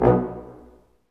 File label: BRS, Staccatos, Ensembl stac; Roland LCDP08 Symphony Orchestra